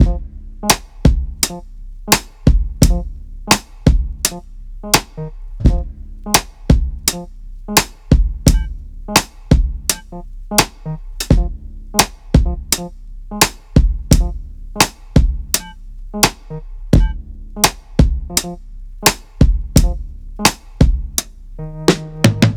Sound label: 3 note melody example